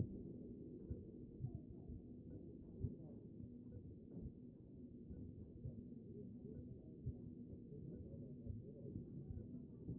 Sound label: A CHEST; B MODE